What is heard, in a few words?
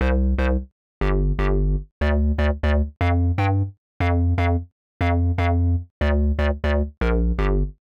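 square bass B C, Nu Jazz House, Bass Loops, 120 bpm; PowerFX - Nu Jazz House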